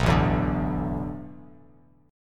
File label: A Suspended 2nd Suspended 4th, Bass Standard; Asus2sus4 strummed